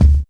natural kick sample d key, kick single hit tuned to the D note